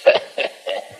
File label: laugh lucifer; ooh ahh